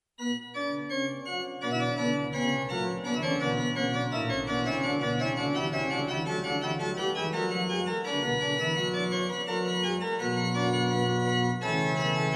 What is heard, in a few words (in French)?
orgue, Fuga; Reducciones